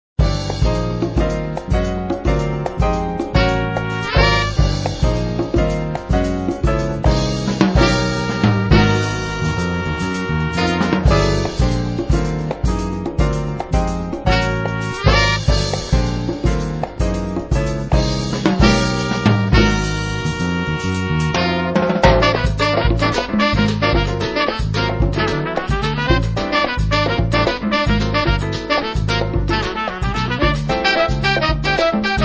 Latin Jazz

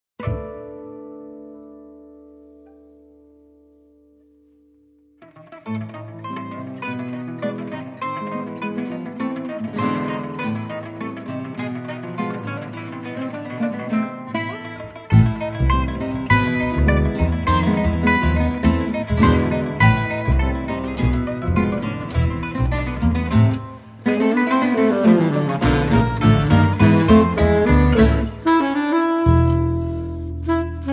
Tango Ensemble

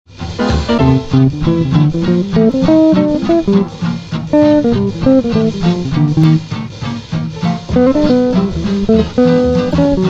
blues

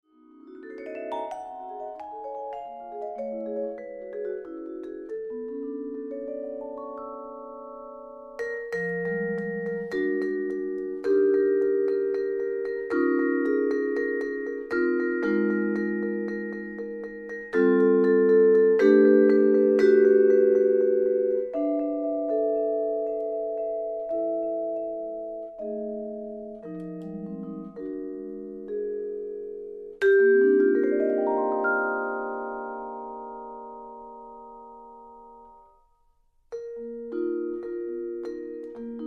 waltz

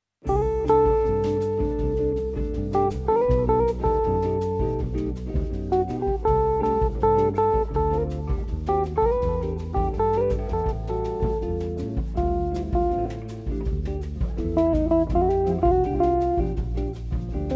guitars

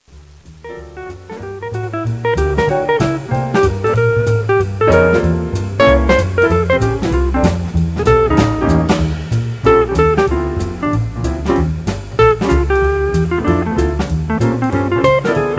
guitar; Jazz Conception for Guitar

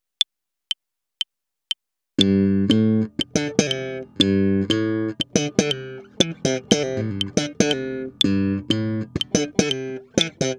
Slap, bassoelettrico

